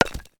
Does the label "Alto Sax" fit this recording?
No